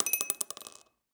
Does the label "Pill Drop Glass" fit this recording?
Yes